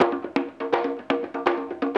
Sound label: BONGO LOOP 124